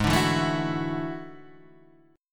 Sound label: E 11th Ab